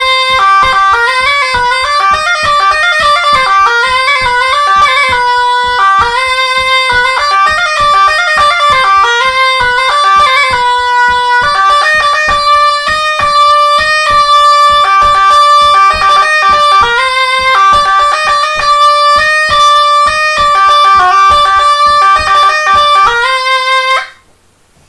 La Bourrée à Mazerier; Bourrees

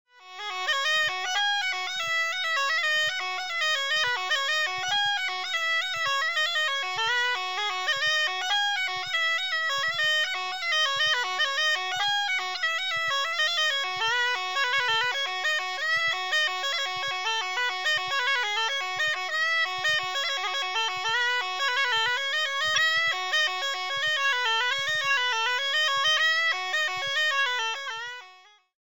La bourrée à Bergheaud; Bourrees

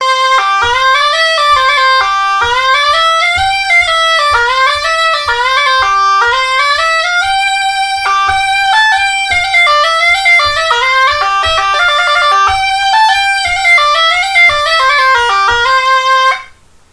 Scottish Valse; Scottishs

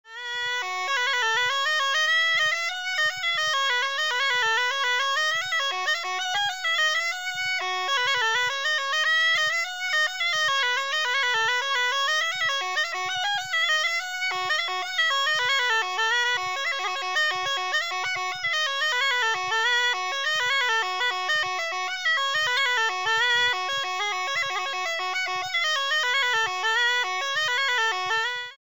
La bourrée à Ranvier; Bourrees

